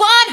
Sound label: vocal